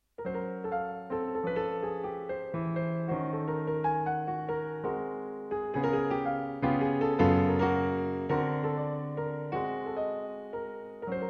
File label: mazurkas; scriabin